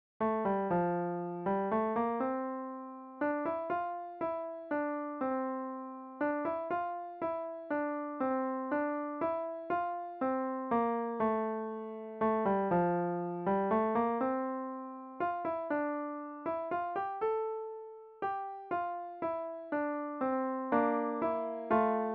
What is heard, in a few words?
the first noel piano